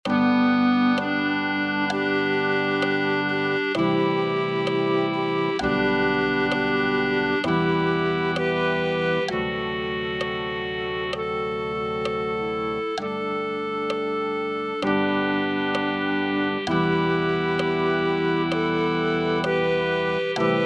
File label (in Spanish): clarinet1